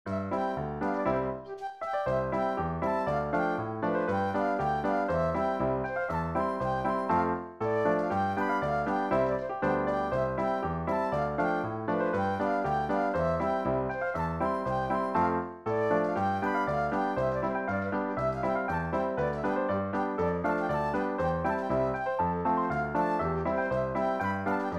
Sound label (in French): Polka du matin